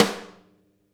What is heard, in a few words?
SNAR, Interactive Drum Kits